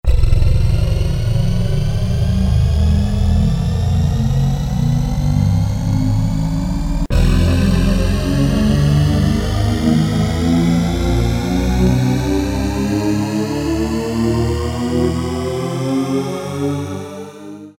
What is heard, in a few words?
EXHAUST, Korg DSS-1; Brass; KSDU-002 Brass